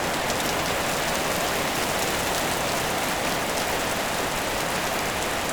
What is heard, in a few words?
rain heavy loop